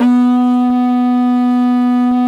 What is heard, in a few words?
HORN, Didgeridoo and Other Primitive Instruments; Big Fish Audio; DIDGEHORN1